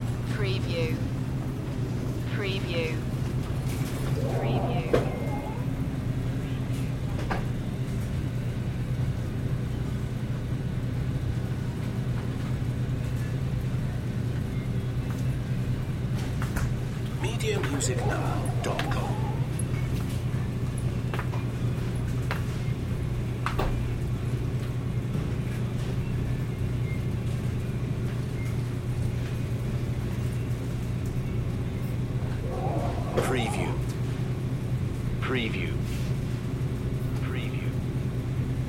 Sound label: Grocery Store Background, Near Frozen Foods Section, Freezer Doors